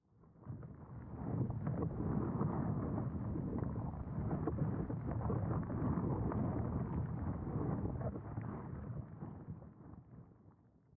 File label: distant rumble; Factorio